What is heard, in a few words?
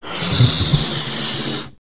balloon blowing up